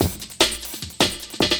LOOP, 150 BPM, Drum & Bass

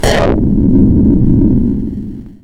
Electron Sidstation, Drum Machines; 300 Drum Machines

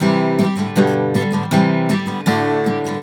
GTR, Celtic Flavours; USB Soundscan vol.42; AKAI

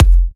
Kicks F; markus hakala

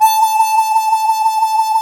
HARMONICA

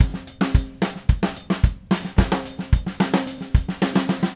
Red Maple Groove 110, DW Red Maple Kit

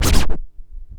ST SCRCH, SCRATCH; AKAI S-Series CD-ROM Sound Library VOL-4

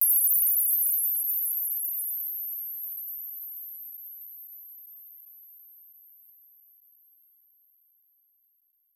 impact high tingle, transition; Polyend; Recue

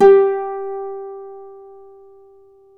Celtic Harp

